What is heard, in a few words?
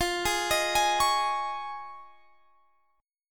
F Minor 7th Sharp 5th, Guitar, Standard; arpeggiated; capo 9